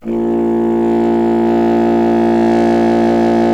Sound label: C. Bassoon, solo instruments; Miroslav Vitous - Solo Instruments VOL-1